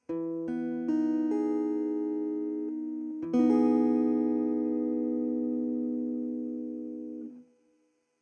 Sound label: emaj7 chord, guitar